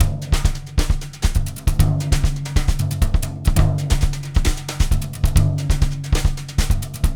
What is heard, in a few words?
samba, brush loops